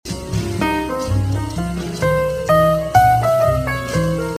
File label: Trio